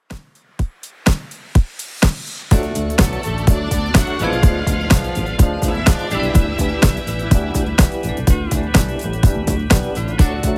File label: 70s Redrum 125bpm